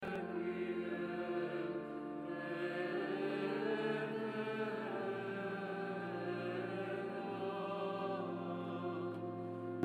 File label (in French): JINGLE REQUIEM CHANTE